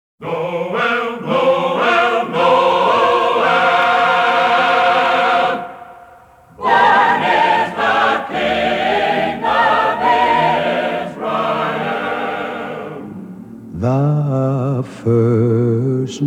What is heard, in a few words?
Christmas Music